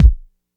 00s small reverb kick drum single shot g sharp key